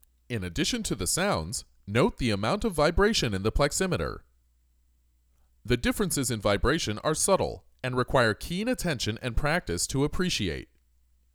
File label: Percussion